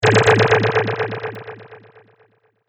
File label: Dimension Demon Attacks, monster sound effect; Monster Sound Effects; fantasy sound effect